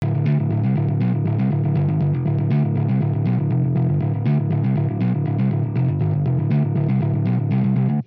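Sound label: amp bass, Hybrid neural guitar overdrive; souldriven; Morphdrive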